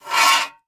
Metal Slide; 1001 Sound Effects

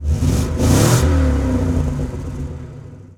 Truck Explorer Rev; SFX Kit; Trucks

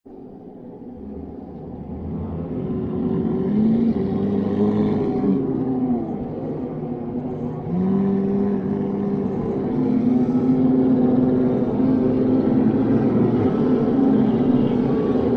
Lonely Manor entrance Hall

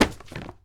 Book Drop Carpet; SFX Kit; Foley - Misc

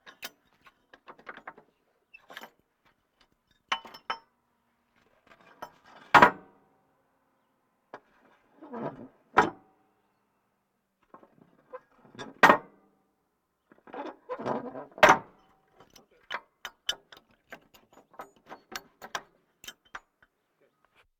Military vehicles

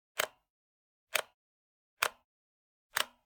Generic Click Plastic Small

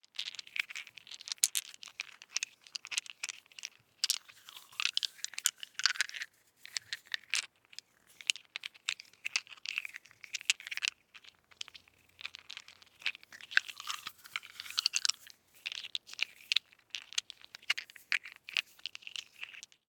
DICE in Hands, Manipulate, 5 Five Dice; Samples; ArticulatedSounds; DICE Sfx Party (Extended)

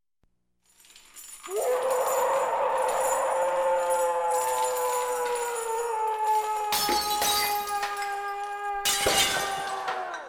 Monster Breaks Window; 1001 Sound Effects; Halloween Horror Sounds Effects

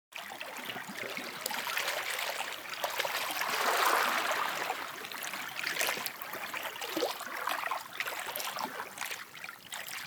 sea small waves; best service Blue Box; sea ocean

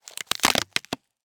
Cardboard, honeycomb, crush, collapse, short; Eiravaein Works; Vaeyan IV

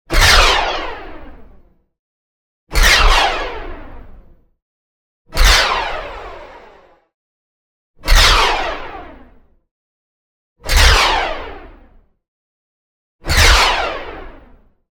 NEON ZAP Bright Arc; boom library

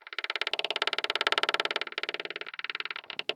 door creak, household; NS Studios - ultra sound collection

